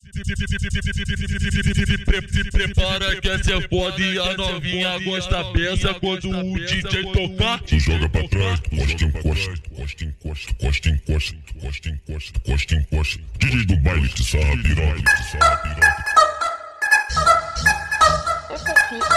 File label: deep flanger echo